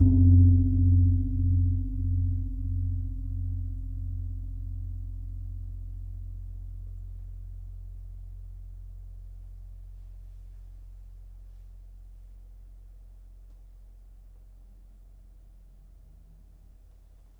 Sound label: Gong Agenga, gamelan; gravityharps; timbrebox